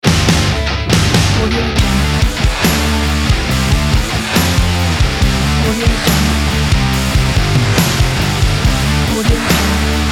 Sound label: energetic upbeat rock opener; mardi-sounds